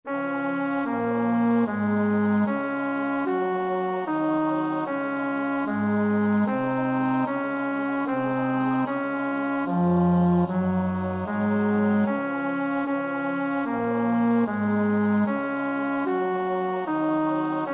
bas; Müller (Heinrich Fidelis); Die Passion; VI. Die sieben letzten Worte des Herrn am Kreuze; Ich danke dir

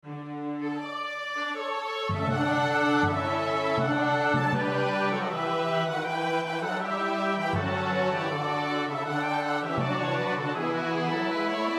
orkest S-A-T-B, koorpartij oefening; Telemann (Philip); Der Tag des Gerichts

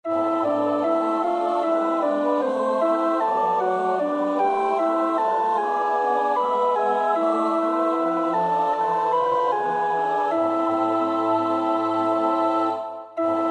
alt, koorpartij oefening; Bach (Johann Sebastiaan); BWV 183; Du bist ein Geist, der lehret

